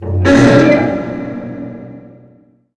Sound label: metal; Call of Duty Modern Warfare